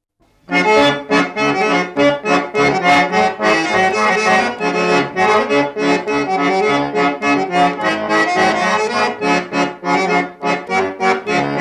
Leilender, loots, folk; Helme

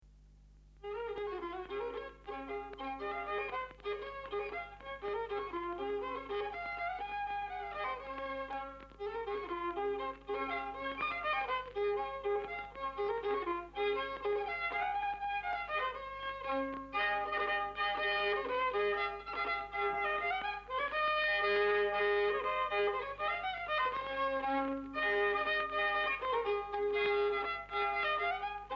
polka Saarde viiul folk; Peetri polka; Hindrek Pukk

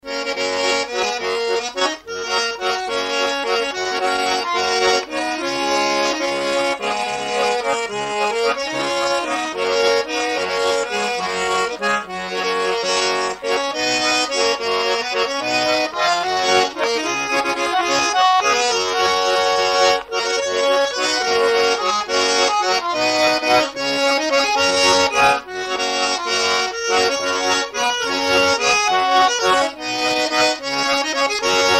valss, loots, folk; Voru valss; Polva

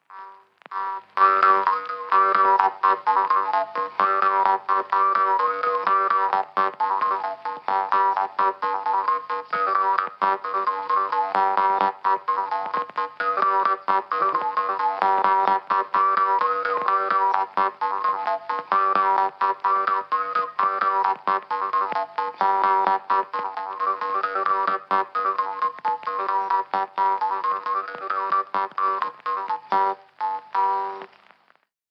Polka parmupill; Kullamaa; folk

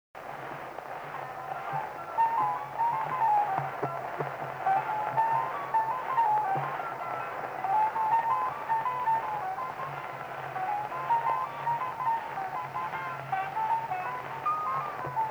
Vene laul, kannel folk; Seto; Ounaski Timo

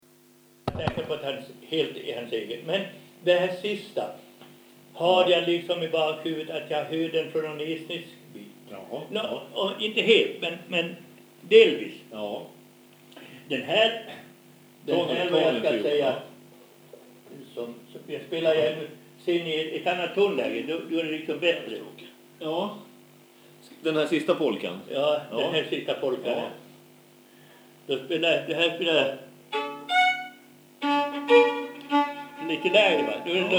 Ruhnu polka viiul folk; Runö polka

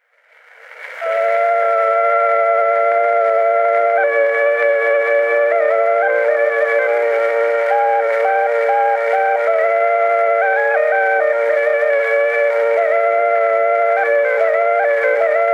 Torupilli Jussi lugu, Emmaste, torupill, folk; Juhan Maaker

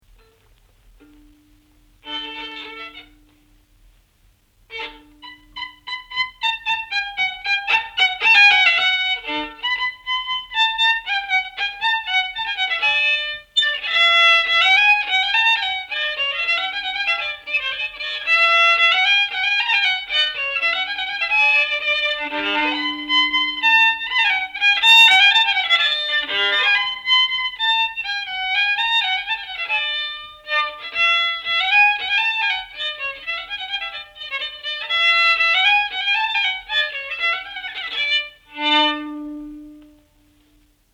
Ruhnu viiul folk; Skon Ilus; Elias Schonberg

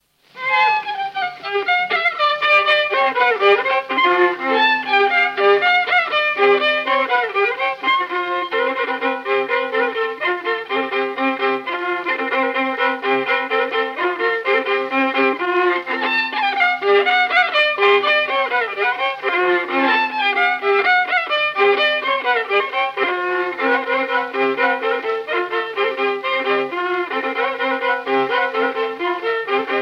polka Paistu viiul folk; ulepiolve polka

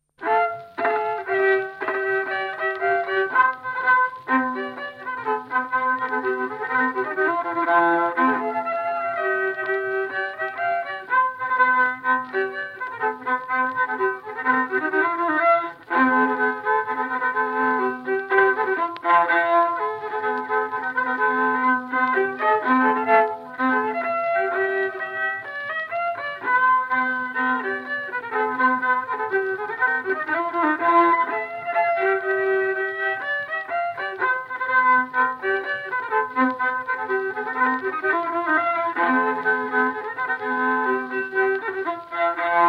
viiul folk; Kihnu; Neljapuari

